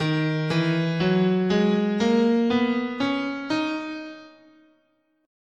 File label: Eb Phrygian, scale